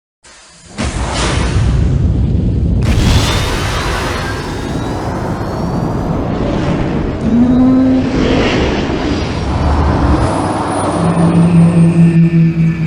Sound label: imperial shuttle